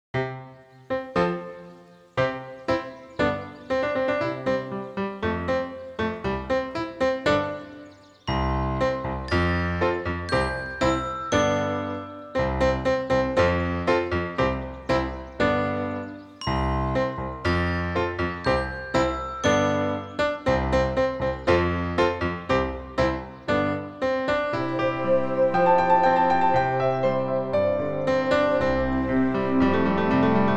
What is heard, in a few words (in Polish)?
KOLOROWE DZWONKI I RURKI wersja instrumentalna; EduMuzArte